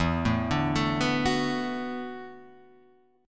E 7th Suspended 2nd Suspended 4th, down, Guitar, Half Step Down